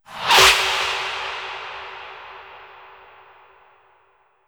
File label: VFX Short Fills & Reverse